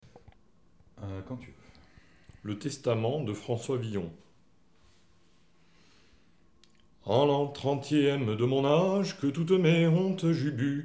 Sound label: Song; Schnee Villon